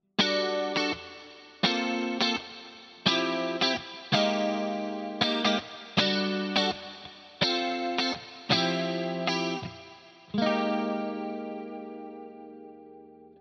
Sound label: MUSIC MAN L3 clean 4th position